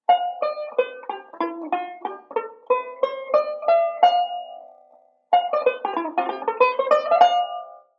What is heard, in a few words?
Modal Sequence; Beyond Chord Melody Volume II